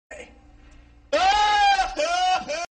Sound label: nerds laughing